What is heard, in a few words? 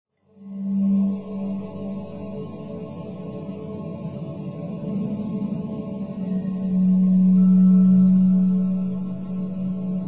drone